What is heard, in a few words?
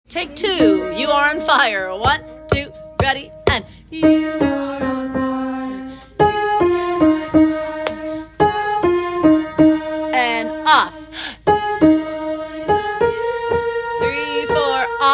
Alto, vocaltracks; Mean Girls